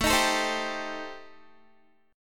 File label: A-Minor 7th Flat 5th, Guitar, chord; Am7b5; strummed